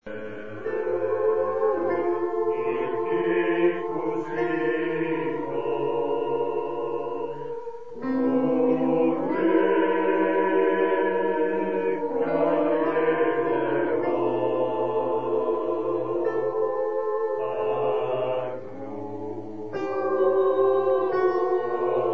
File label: tutti